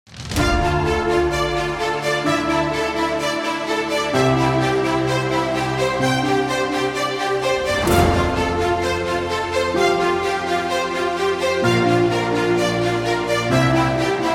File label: Dramatic Orchestra; epic trailer